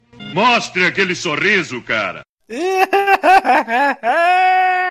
hahaha

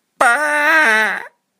Baaaaa!

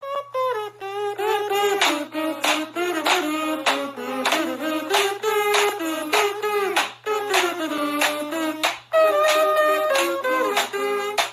hot dog kazoo

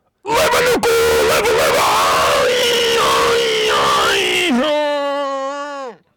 rage aaaaaaaa

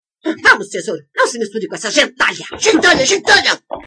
jingle; gentalha

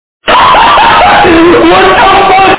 wtf bass

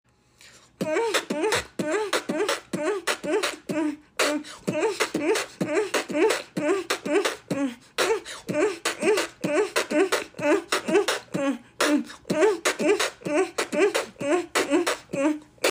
beatbox sus

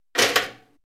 toaster

